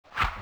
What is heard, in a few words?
tweet